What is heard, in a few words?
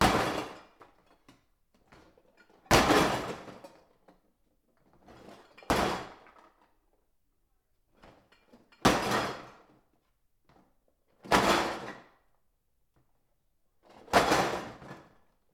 Junk Pile